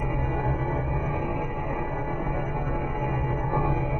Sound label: Tonal Re Draw, sparse soundscape